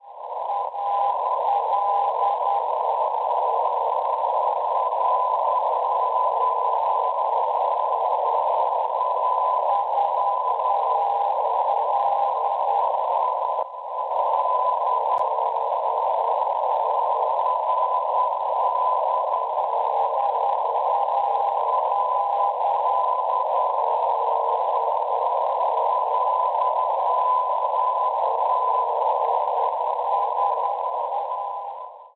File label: NDB 417 kHz; LI; Duesseldorf; Schwarzsee